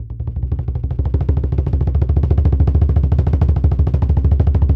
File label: Bass Roll, African Drums, Bass Drum Rolls; Quazibeat; African Secret